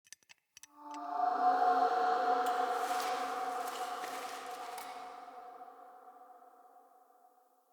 Helmet Idle; Friendly; Tuvalkane; Discovery

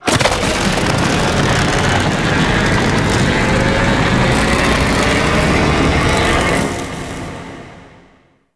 Void Dragon Orb Charge; Enemies; Abilities; Warframe; Duviri

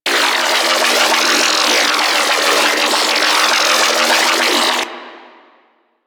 Beam Loop; Glaxion Beam Moa

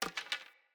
Bow Ranged Equip; Weapons; Envoy Hunter Bow

